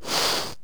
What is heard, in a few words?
fire arrow hit; attack projectile; fire arrows